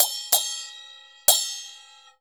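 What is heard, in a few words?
CHINA SM9 R, China cymbal; Spectrasonics - Heart of Asia CD2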